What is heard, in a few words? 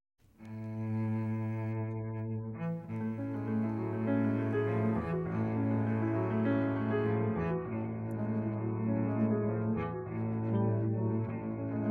Instrumental Track; auditions